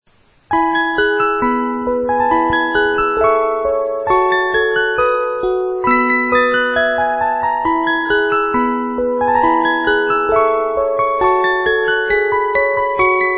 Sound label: Jingle Bells, 30 Note